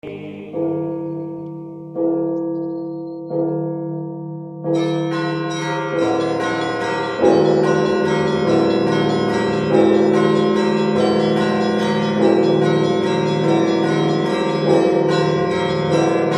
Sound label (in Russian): ЗВОН СВЯТОГОРСКИЙ